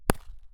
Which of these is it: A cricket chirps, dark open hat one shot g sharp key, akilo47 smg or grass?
grass